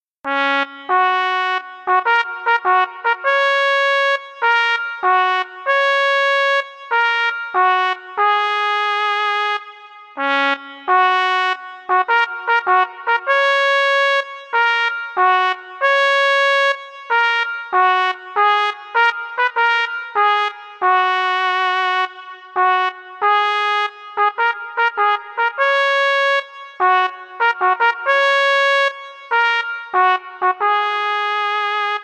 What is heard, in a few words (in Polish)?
hejnal; chocianowa